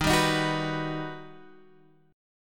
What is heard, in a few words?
G Augmented Major 7th